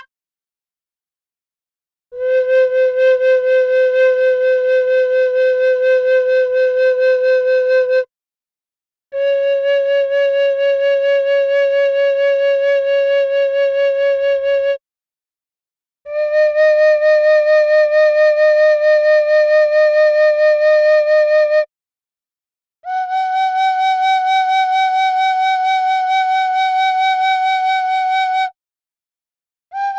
Gamelan; Propeller Island - Complete Gamelan

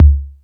Beat Box; E-MU Pro-Cussion

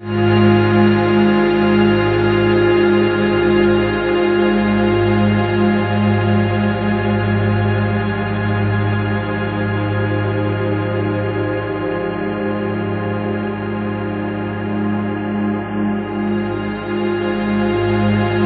BIG CHOR, ORGAN ST, Ominous Drones; USB Soundscan vol.48; AKAI

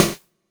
SD, Dance Mega Drums; Best Service; SD-HIP

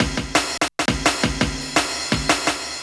Desert Sun 170, Drum&Bass Breaks

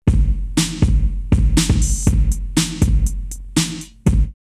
Rare Beat 120, drumloops, Techno&House; X-Static Goldmine

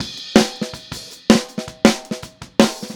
Snare, 162 BPM; Verse; Drums

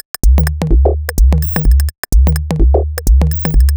Pompoms 127bpm, House Drumloops; 90 s Sample CDs; Zero-G House Fabrik Drumloops; ZG Drumloops

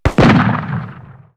Big Bang, perc fx; X-Static Goldmine

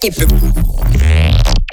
glitch stab; Virtual Riot; Glitch Stabs

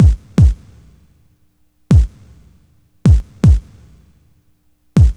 GRV, Guit It On; E-MU Formula 4000 Series Vol. 1; Hip Hop Nation; Guit It On 78.5

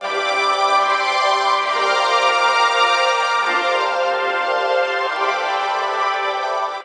Synth Beautychords; Sonic Foundry (Sony Creative Software); Knutrix Drum & Bass

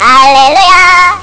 haleluja; Amiga Soundtracker Sample Packs

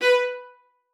MART B4, VIOLA SPICC; Xsample VOL-5; 90 s Sample CDs

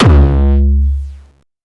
Jump kick, Jump hardstyle kick

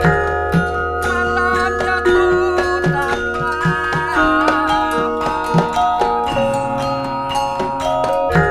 GMLN, Gamelan Orch; Roland - LA Composer vol. 1

